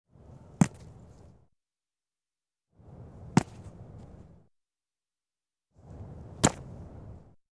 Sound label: Football, the goalkeeper catches the ball